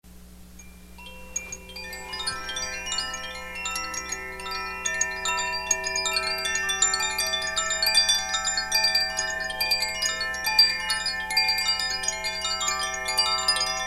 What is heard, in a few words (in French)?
carillon aube moisson